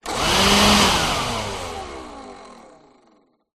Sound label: industrial leaf blower electric on run off close up short burst